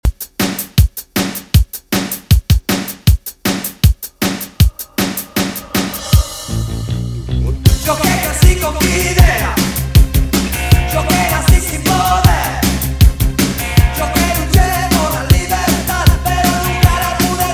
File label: 78 bpm